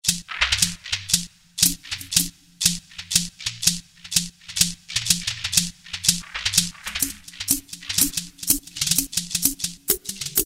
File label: SHEKELE, Latin And F.X. Percussions; Roland SN-U110-02